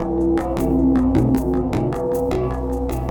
ELECTRO TRIBE DRONE GROOVE; Sonic Foundry (Sony Creative Software); Vir Unis Infusion

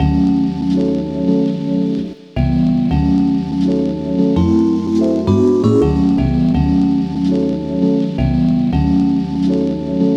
Vibra Vibe 165 A; Drum & Bass; Total Drum&Bass

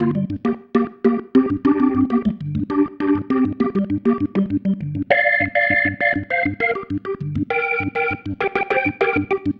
Pattern, Organ

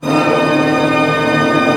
TREM A FF, orch trem; Sound On Sound; Peter Siedleczek's Orchestra

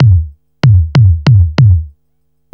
loop 95; AMG - Vince Clarke Lucky Bastard

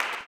CLAP, Blues Soul; Spectrasonics Vocal Planet

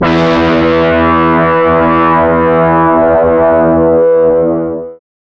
GUI CR E, E DISTORTION, Metal Mix; Sound & Vision; Gigapack I CD 2

